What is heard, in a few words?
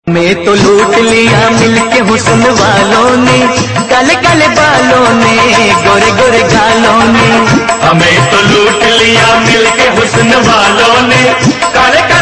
Hindi